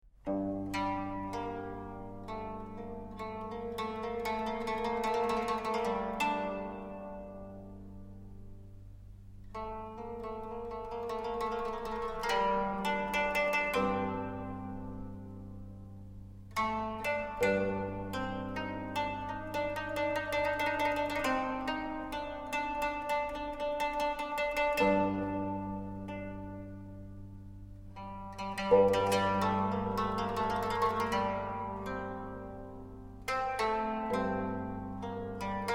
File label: instrumental dialogue